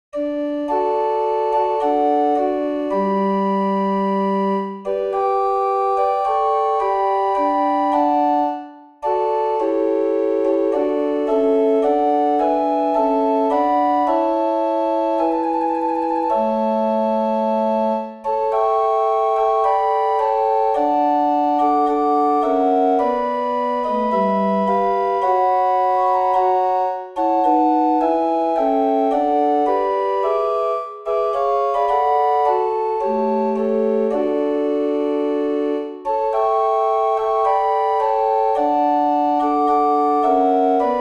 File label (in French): hymne national danemark; kra yer hans ernst